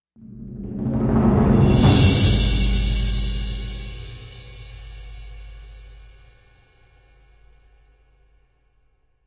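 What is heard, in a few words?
Crescendo Off Ramp; Brand X Music; Brand X Music Catalogue - Editor's Essentials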